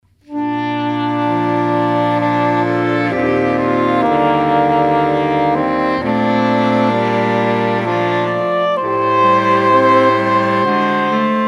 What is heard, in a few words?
sax